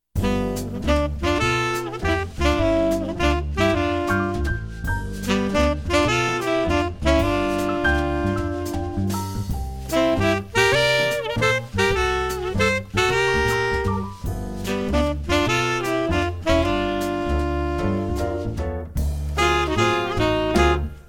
sax duets tenors

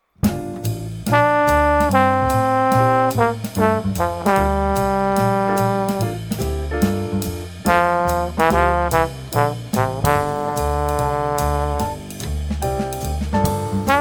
trombone phrasing; rockwell

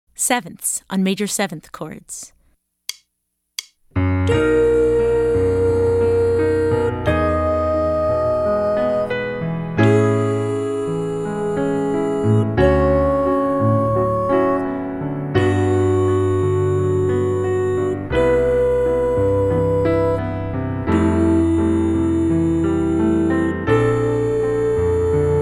7ths On Maj7th Chords

